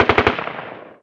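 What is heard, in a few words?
gun m16 distant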